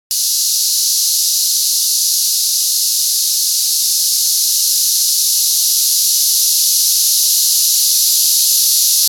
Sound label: the loud hiss of high pre